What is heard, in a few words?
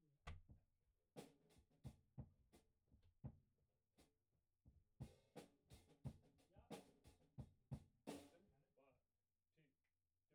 Bass Amp